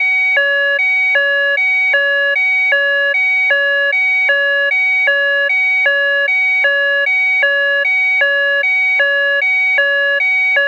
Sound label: alarm